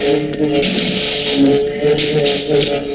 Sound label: BATTLE; East West - Global Sounds; STARS FXS